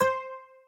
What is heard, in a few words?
cursor